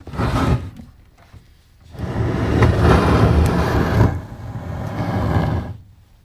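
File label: Desk Slide On Wood Floor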